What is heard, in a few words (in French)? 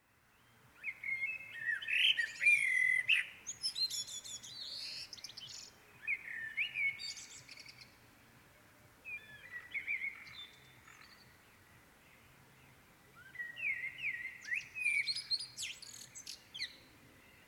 Matin des oiseaux; Nature